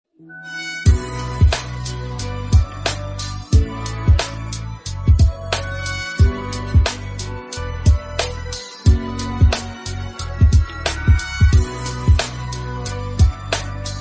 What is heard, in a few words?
Instrumental Song Ringtone